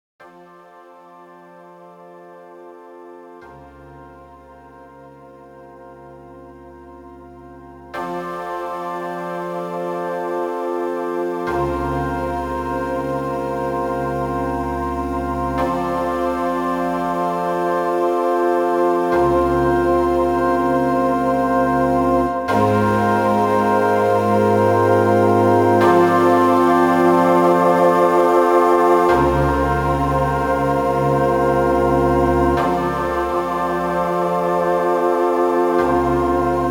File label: WorldInstruments